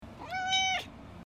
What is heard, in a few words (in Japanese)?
鳴き声